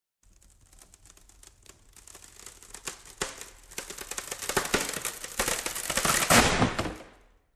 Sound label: Wood Crackling